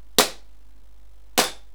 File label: standard, maruzen p99